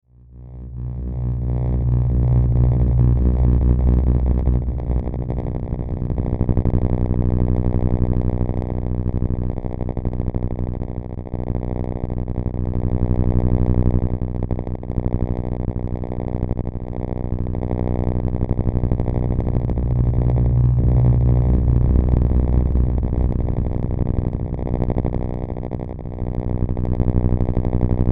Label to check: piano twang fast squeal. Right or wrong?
Wrong